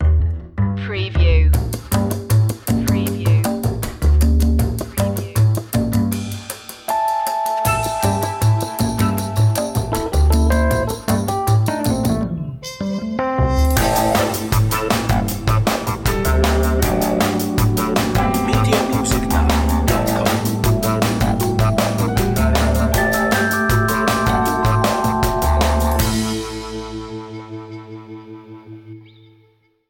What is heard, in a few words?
jazzy opener